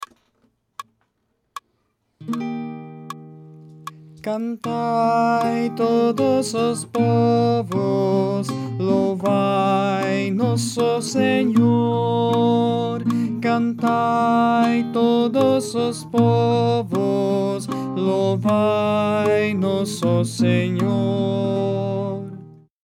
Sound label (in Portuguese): Cantai todos os povos Tenor